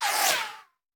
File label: Bullet Pass By; Sound Ideas; Guns